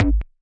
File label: nasty stormfront; Nasty Stormfront Electro FX